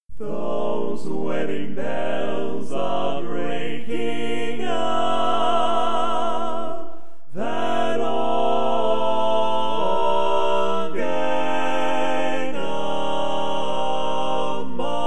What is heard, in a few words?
Wedding Bells, Lead, Barbershop